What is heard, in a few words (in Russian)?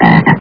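звук обычного кваканья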